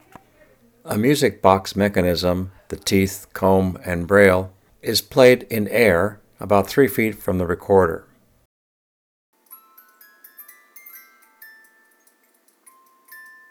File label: music box